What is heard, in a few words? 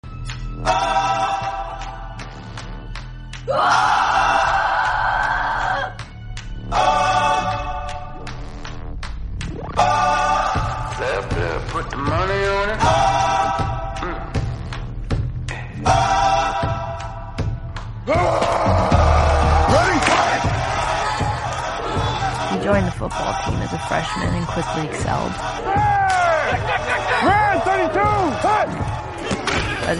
english song